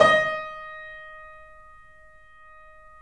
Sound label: The Piano, D#; InVision Interactive - The Piano